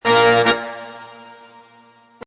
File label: Horns Short Riff A# 110, Horn riff in A# at 110bpm; Brass Loops; Horns Loop Short Riff 2 A# 110bpm